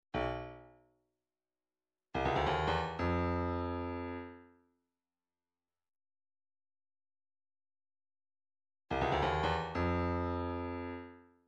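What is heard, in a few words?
142 native american drum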